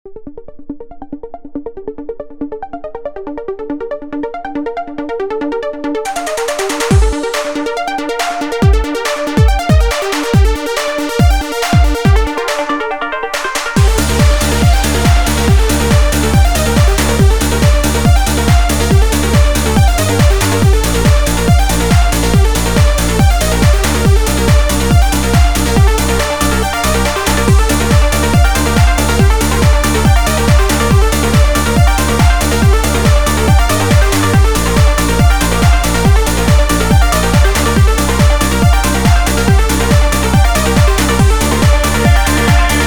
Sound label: (Trance Mix)